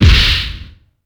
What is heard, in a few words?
HIT PUNCH H; UFC Tapout